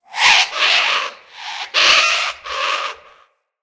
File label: Amb Corrupted Bird Close; Ambience; Mendicant Area